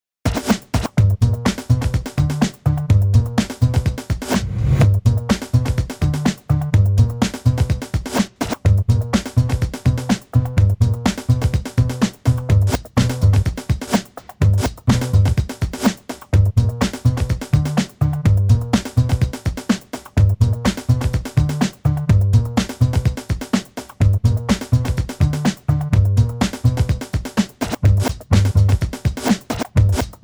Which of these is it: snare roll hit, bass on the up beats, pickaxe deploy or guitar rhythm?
bass on the up beats